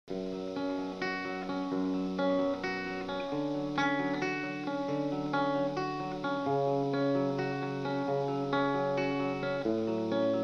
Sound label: Ringtone